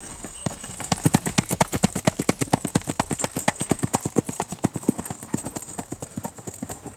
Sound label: fast horse galloping past on dirt; footsteps